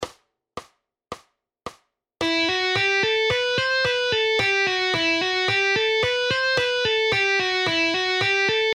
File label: Guitar speed exercises